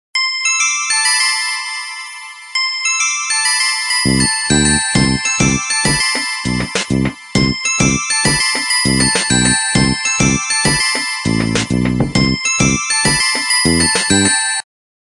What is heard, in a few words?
Tango, ringtones; Okapia; Spark